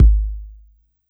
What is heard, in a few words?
BD Analog H, Yamaha PSR-E323; Analog Kit; drum machine